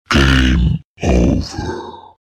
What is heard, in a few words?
game over